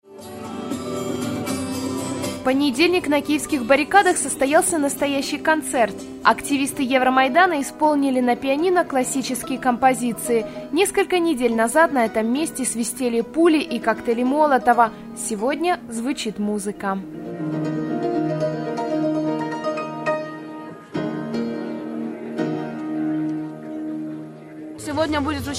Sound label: PIANO; UKRAINE